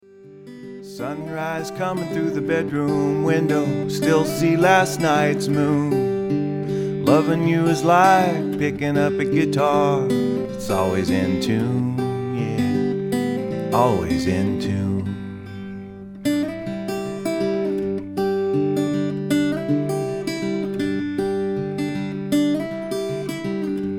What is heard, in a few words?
Tune